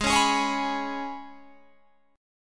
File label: G sharp Minor Double Flat 5th G sharp, Guitar Open G; G#mbb5 strummed; 18,18,18,18,21,21